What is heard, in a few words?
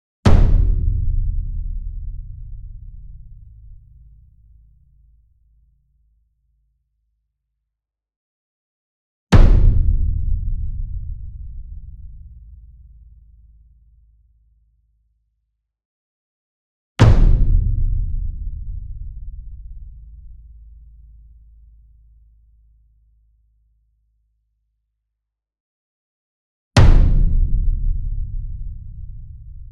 ENSEMBLE DRUM Bass Drum Concert Hit Near; boom library; Cinematic Strikes Construction Kit